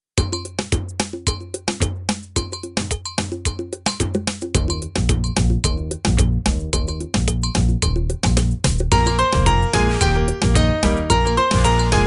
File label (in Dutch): karaoke; oversteekliedje